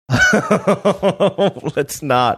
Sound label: laughing saying let's not